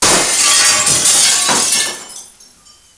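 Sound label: break glass; PC Gamer IT CD 38; thief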